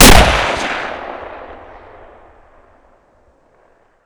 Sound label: Inf Weapons; Red Orchestra 41-45